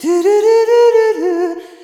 Arrhythmic F

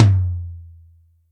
Maple, Acoustic Tom, Yamaha DTXpress

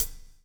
BLUES TK, Backbeat; Spectrasonics - Backbeat

